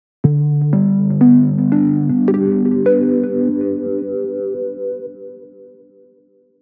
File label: Island Keys, jup8